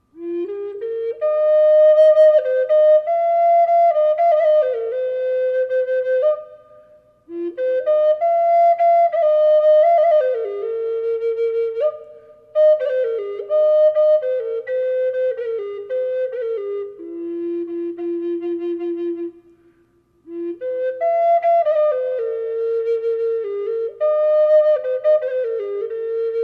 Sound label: ironwood flute reverb buffalo yellow cedar